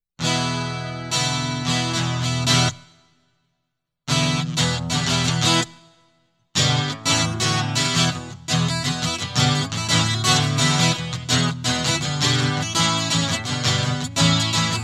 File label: Guitar